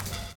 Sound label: DRUMMER